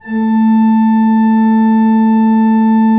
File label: A2, Cathedral Organ; ILIO; Propeller Islands